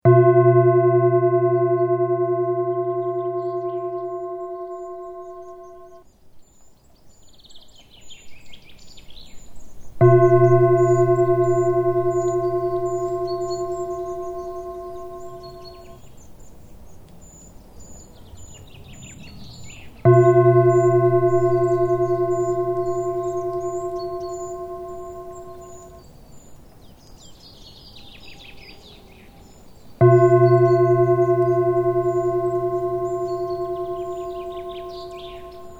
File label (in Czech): Koherentni dychani zvuk les